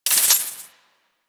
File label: Quill Dart Gun Mode Switch Poison; Warframe